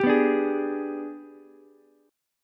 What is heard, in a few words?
Minor Major 7th Flat 5th, Ukulele; G#mM7b5 strummed; 12,11,10,11